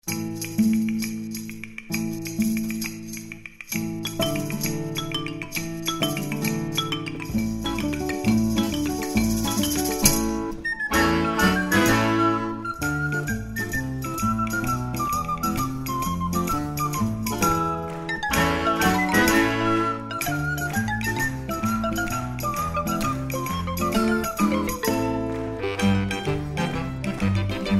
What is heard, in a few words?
Tarantella